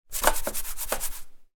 foley fingers rub wooden table as if to check scratch